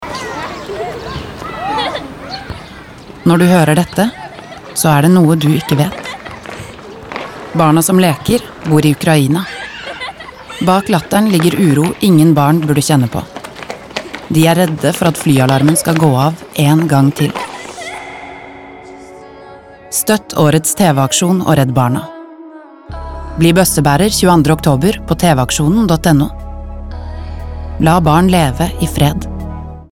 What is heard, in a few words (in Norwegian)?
Flyalarm; Tv-aksjonen redd barna